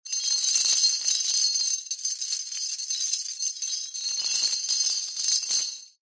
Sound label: industrial chain small movements drag lay down concrete